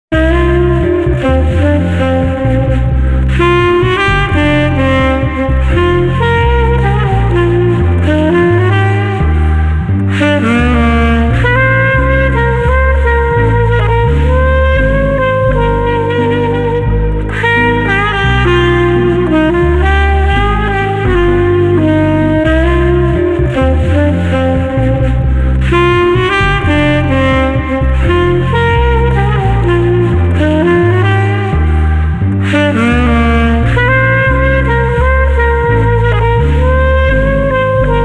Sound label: Sax Loungey Combo 86